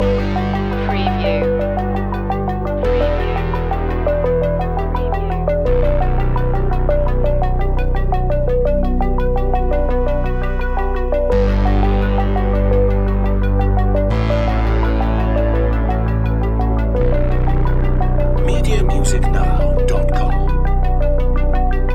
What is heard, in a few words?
electronic thriller loop